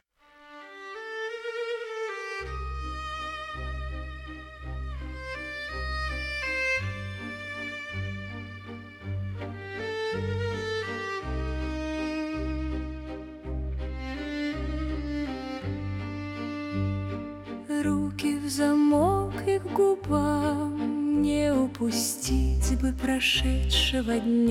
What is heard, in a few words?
tango